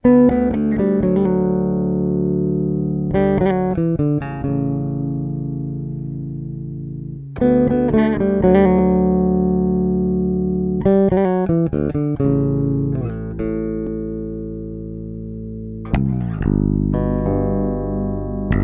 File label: Bridge passive